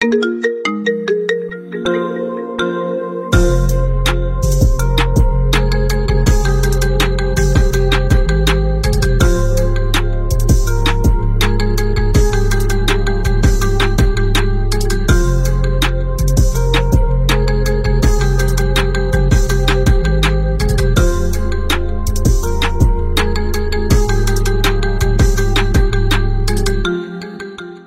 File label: Marimba iPhone